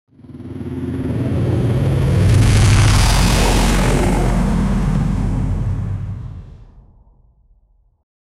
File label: racing game, achievement